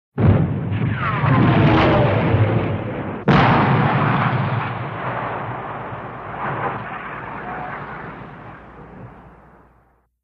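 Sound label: Incoming Shell Whir Impact, modern artillery exterior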